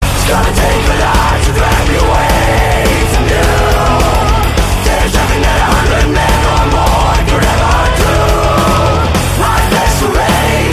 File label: metal, ringtone